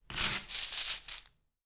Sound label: Coin Bag Pick Up